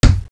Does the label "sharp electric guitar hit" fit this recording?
No